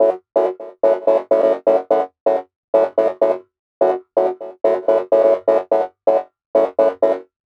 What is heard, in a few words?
126 F# MUSIC; funky; Loopmasters; Funky Underground Vol 2